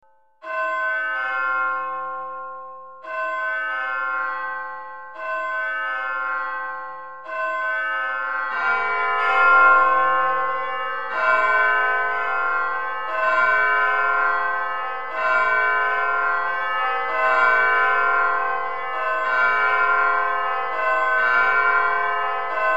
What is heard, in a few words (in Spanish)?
campanas